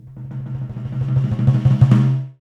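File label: 125 bpm, daiko, low drums; Spectrasonics; Supreme Beats; World Dance; Asian Daiko; Daiko Rolls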